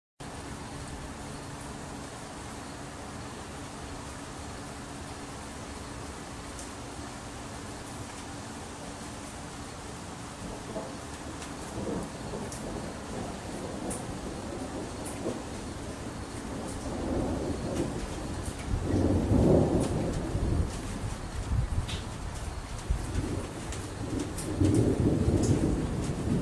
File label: August Thunderstorm, Soundscapes; Music